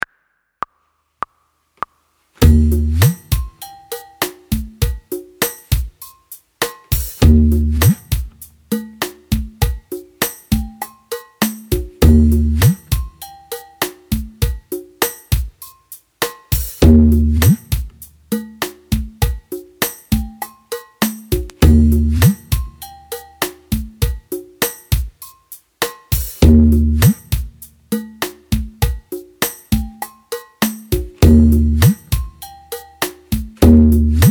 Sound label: Slide Practice With Tabla